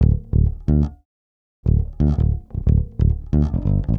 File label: Octaves Groove, Bass Drum Kicks